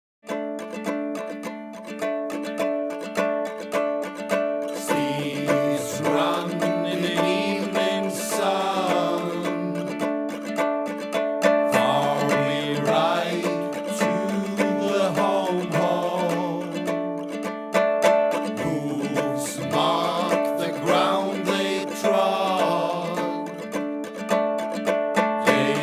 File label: kitara+drum+chorus+flute